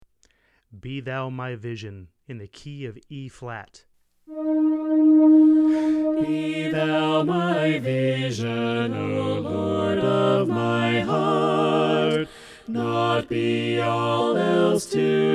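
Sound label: Be Thou My Vision Soprano Left, choir part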